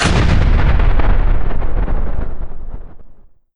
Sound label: explode, revanent; doom3